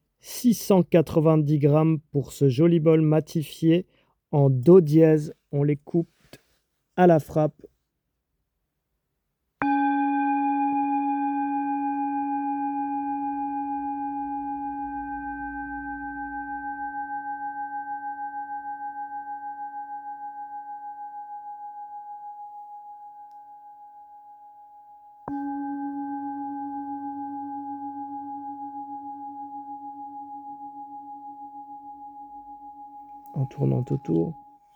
Bol Tibetain Martele Matifie Naturel 7 Metaux Note Do Diese

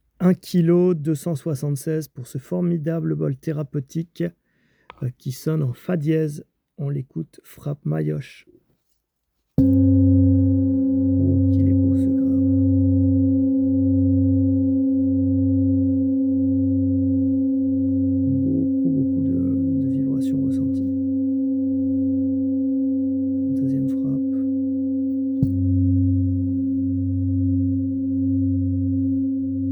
Bol Tibetain Martele en Alliage 7 Metaux Therapeutique Note Fa diese

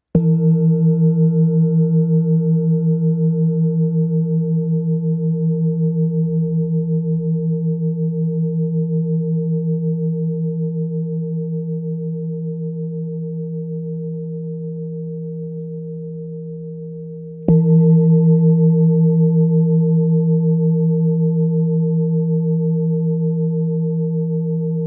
Bol Tibetain Martele en Alliage 7 Metaux Therapeutique 21 cm

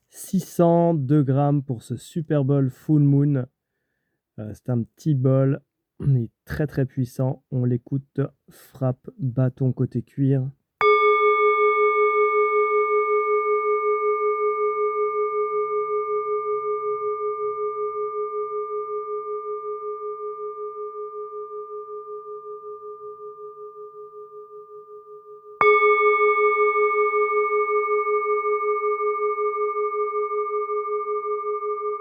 Bol chantant tibetain Full Moon 7 metaux Sol♯ 140 mm

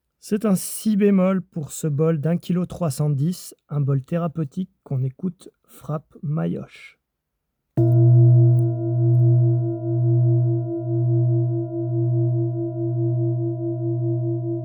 Bol Tibetain Martele en Alliage 7 Metaux Therapeutique Note Si bemol 22 cm

